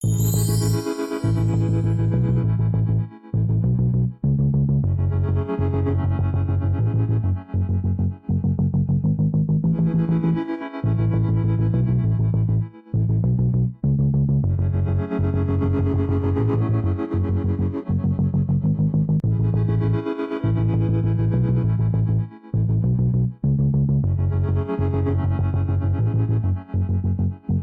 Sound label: Tension Curve; nova 111; ps vita